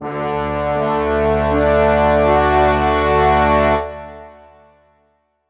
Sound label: mission success